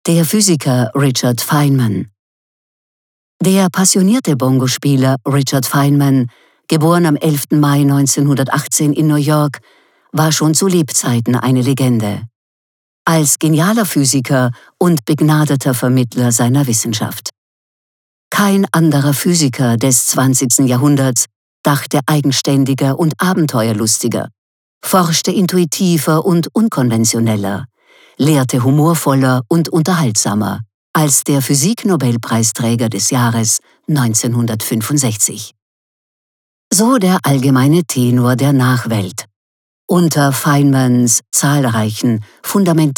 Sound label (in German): Trommeln; Trommeln der Erkenntnis